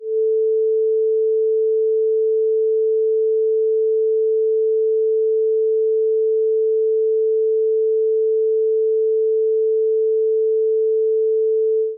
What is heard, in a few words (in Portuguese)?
onda senoide